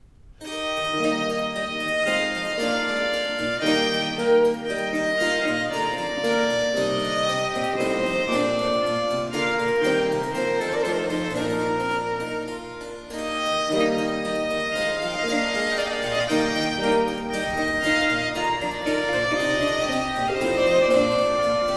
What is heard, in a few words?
Sonate 7 in F major Sarabanda, vivace; 3. Sarabanda, vivace; Arcangelo Corelli; Corelli - Complete Works; Klassika